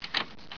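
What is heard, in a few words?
door lock; THE REALM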